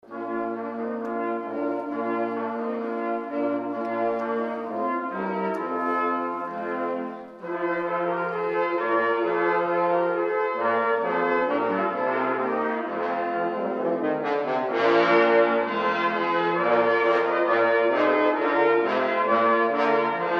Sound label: Trumpet Voluntary